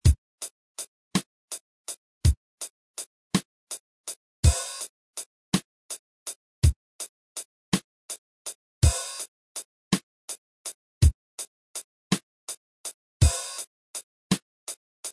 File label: example three four time beat